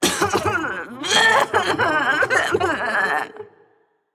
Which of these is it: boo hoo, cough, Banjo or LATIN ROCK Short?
boo hoo